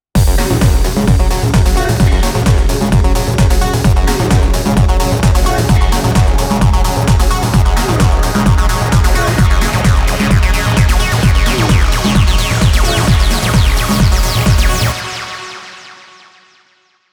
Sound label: glitch